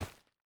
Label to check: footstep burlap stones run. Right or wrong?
Right